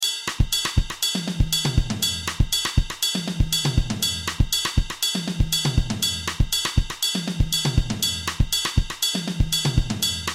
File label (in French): songo batterie